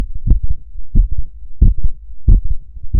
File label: heartbeat tense; Horror